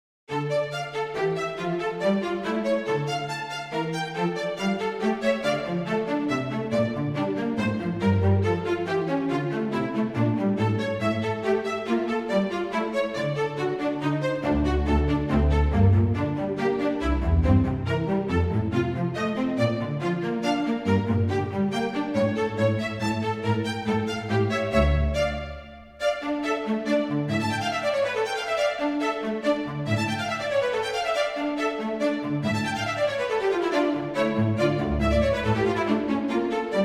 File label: Sonata in D minor; Scarlatti; hold music